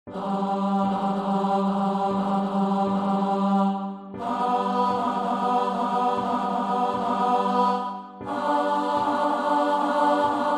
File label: Sop from E